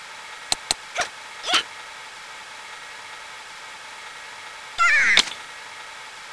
idle, weapons; tfa cso; katyusha

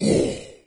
melee; doom3; commando

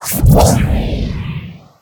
charge cast

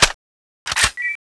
reload, weapons; tfa cso

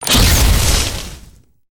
gunstand flamethrower fire burst, turret; gow; turret judgement

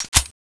bolt pull empty, mauser c96; tfa cso

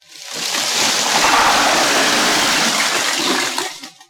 alumin fill

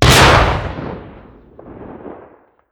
tnt explode

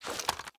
lean in; ins2; weapons

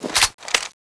on shoulder, dual uzi; tfa cso

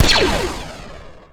blaster fire

